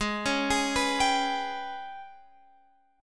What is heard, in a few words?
Ab Minor Major 7th Double Flat 5th, down, Guitar, Open F; arpeggiated; AbmM7bb5